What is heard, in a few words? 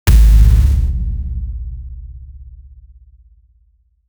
satisfying hit impact vid; videogame